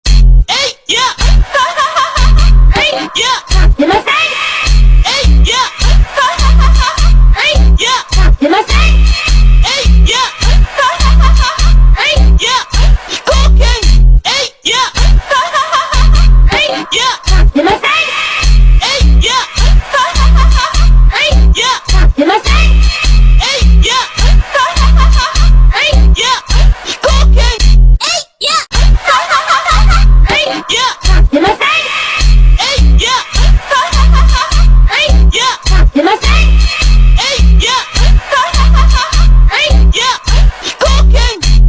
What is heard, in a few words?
slowed brazilian funk